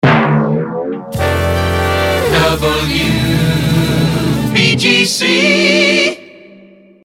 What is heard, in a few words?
jingles, resings tympani; pams series 42a